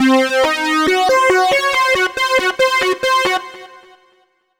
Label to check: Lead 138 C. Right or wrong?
Right